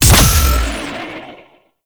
Power Slide Boost Ready Stinger, Motorcycle; Warframe; Motorcycle Ninety Nine

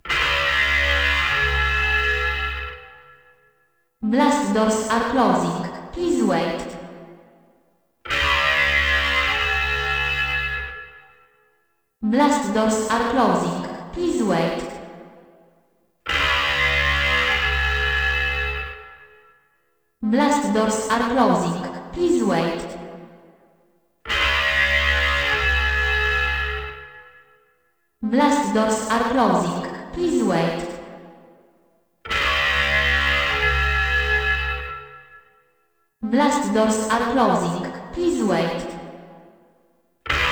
Blast Door Alarm Loop; Ambience; Gameplay; Warframe; Nineteen Ninety Nine

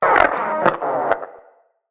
Wyrm Idle, Pets, Vocals

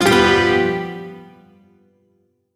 Shawzin Maj Chord; Warframe; Duviri

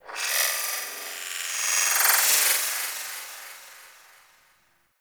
Tech Texture Small; Warframe; Ambience; Cephalon Weave

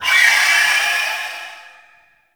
Blade And Whip Heavy Vocal; Warframe; Melee; Dagath Blade And Whip

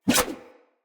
Large Dagger Whoosh; Snc Large Dagger

